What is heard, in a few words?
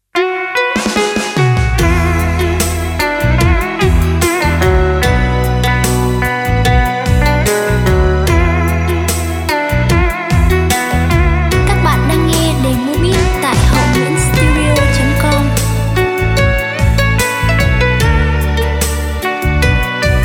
beat, playback